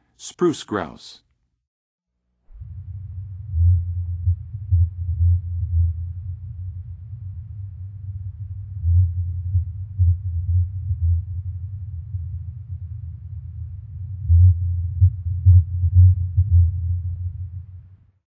Spruce Grouse, Birds of North America; Western USA; Western USA Songbirds

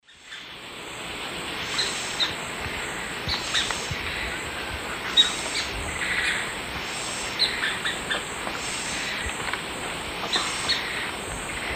Veniliornis affinis, group calls; S. America; Eastern Lowlands

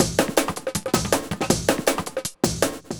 rumble 160

